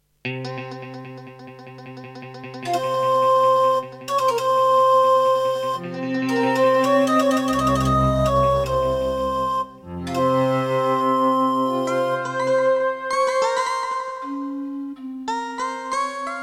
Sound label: Tascam Pocketstudio 5; Prince of Persia 2 - Intro